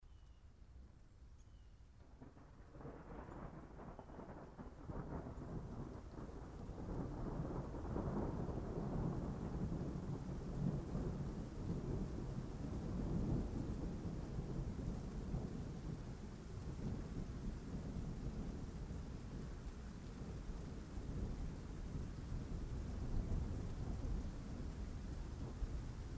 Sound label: soothing rain